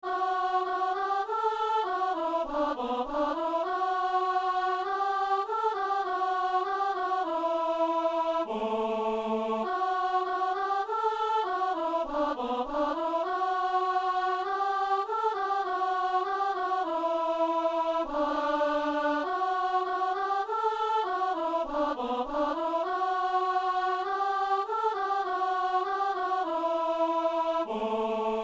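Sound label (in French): alleluia, assemblée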